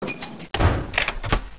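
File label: buzzer door close; svencoop; sence; mistake coop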